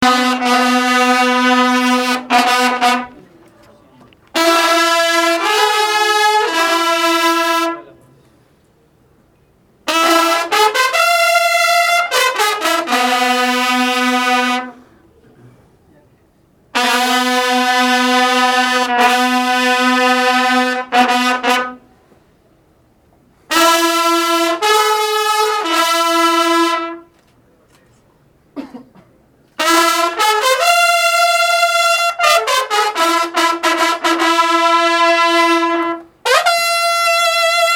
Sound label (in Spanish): grupo de trompetas semana santa